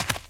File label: dirt step; Golden Crayon Sounds